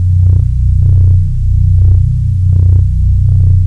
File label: blackhole idle